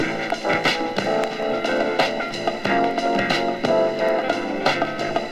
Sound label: keys